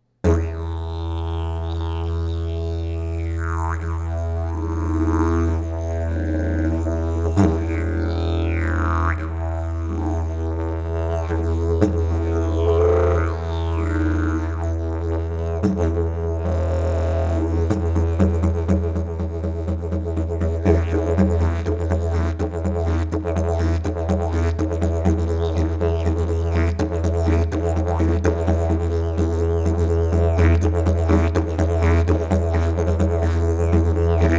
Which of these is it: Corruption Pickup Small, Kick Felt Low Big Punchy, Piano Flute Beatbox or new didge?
new didge